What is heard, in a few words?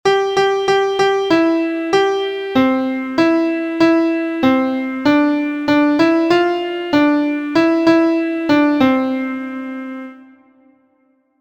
MIDI